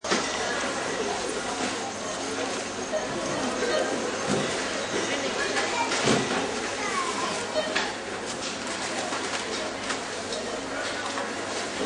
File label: Supermarket Ambience, medium distance from checkout area; Checkout tills beep, scan bar codes, people moving and shopping